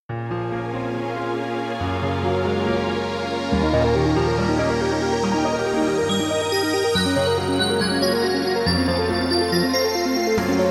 Off Vocal

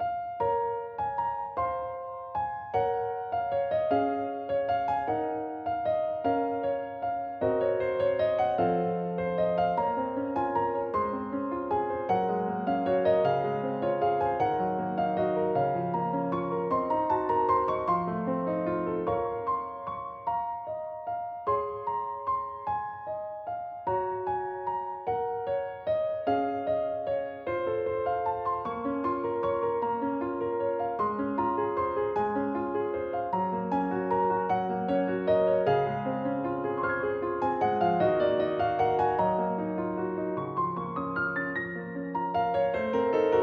bgm cry

